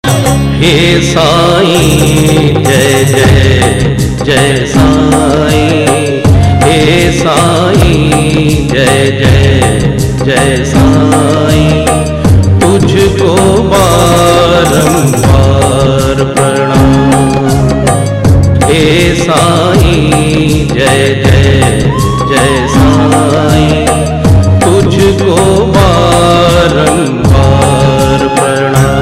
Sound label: hey sai jai jai sai, saibaba ringtone; Devotional Ringtones